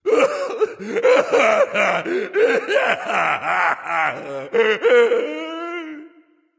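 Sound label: nonverbal boohoo; smite; voicepack; ullr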